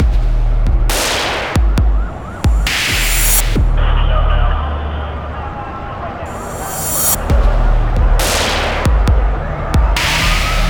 Skybar Music Loading; Battlefield 3

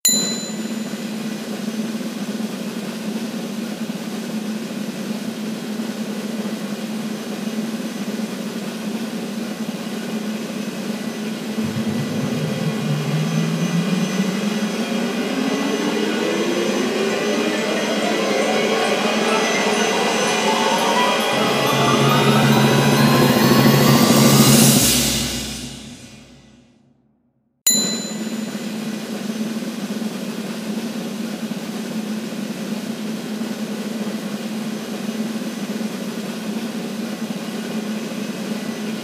Drum Roll Stream; fat princess fistful of cake; psp

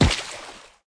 Footsteps; SFX Character

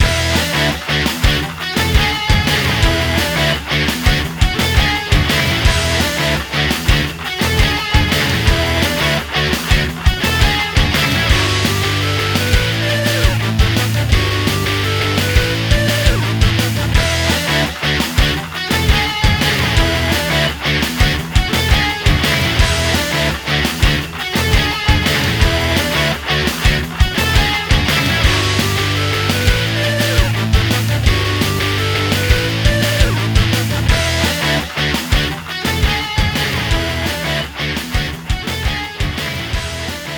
3d Music

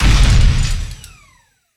Sci-Fi Weapons-Assault Rifle-Single Shot

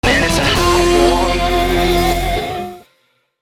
Hot Evil Jingle

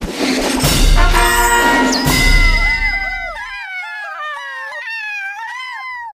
jingle medaillon full

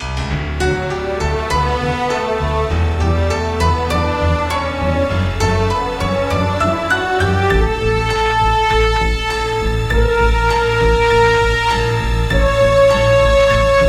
Summit Success Fail; club penguin island original soundtrack

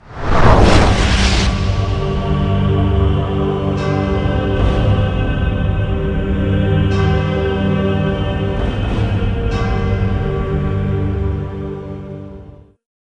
monastery - past transition

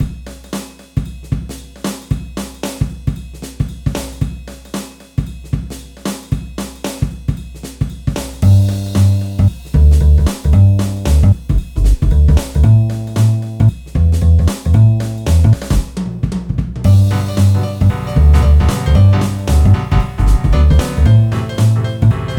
jazz; hoshizora no daihanseikai

